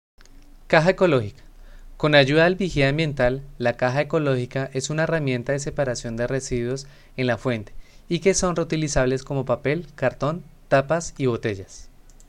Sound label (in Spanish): CAJA